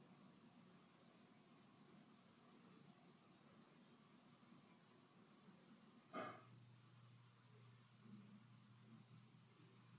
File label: Empty Room; Night 1; historic rainworth lodge investigation